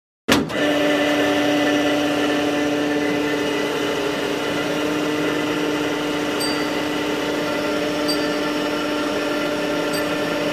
Pump; Petrol; Digital Petrol Pump Start, Run And Stop. Two Takes.